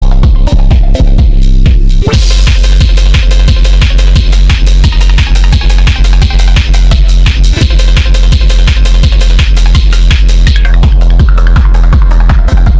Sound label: phonk